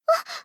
wah

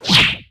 arm

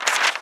page turn

